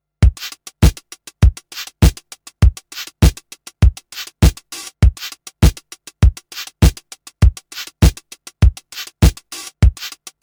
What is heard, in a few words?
Drum Machine Original